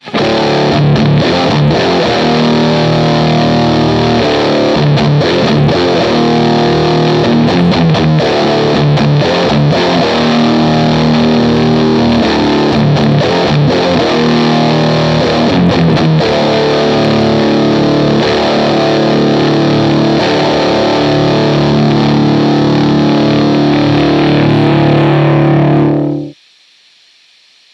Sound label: blackstar full blown amp; subdecay